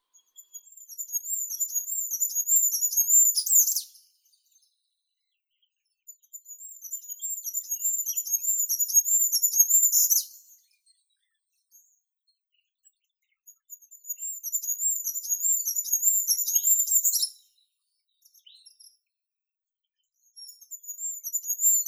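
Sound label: goldcrest, regulus regulus; korolek zheltogolovii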